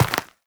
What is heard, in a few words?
step, footsteps, player, dirt